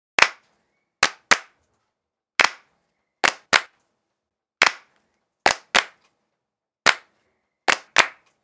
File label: solo claps